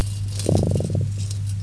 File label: Growl; Unknown sp